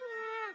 んー

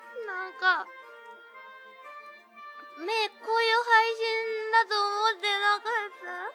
嘆き; 感嘆

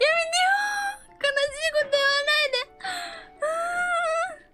泣きボイス; 壺

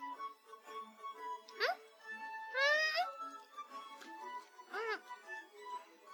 咀嚼

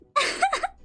あははっ; ママになります

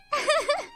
うふふっ; マイクラ

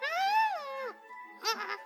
"んん゛", 情けない声

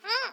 ん！

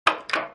ドア音

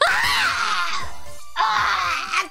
嗚咽; 情けない声

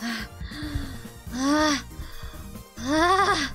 はぁ…はぁ…

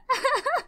あははっ; 壺

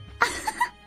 あははっ; マイクラ

